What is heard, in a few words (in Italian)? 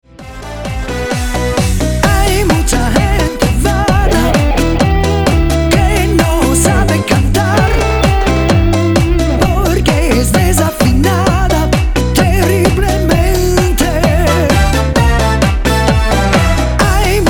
karaoke